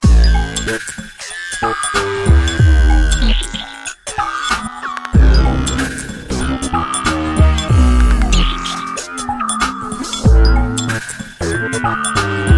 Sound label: big lead; naught reawakening